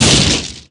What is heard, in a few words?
fire; CLANNAD FULL VOICE; Key